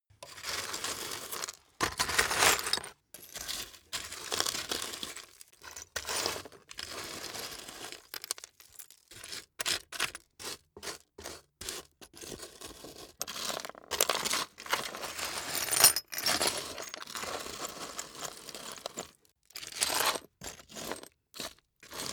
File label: glass debris searching; atmosphaeren umwelt; baustelle